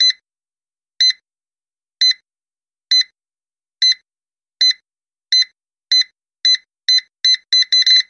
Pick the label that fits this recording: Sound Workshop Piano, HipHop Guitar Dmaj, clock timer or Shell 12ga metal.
clock timer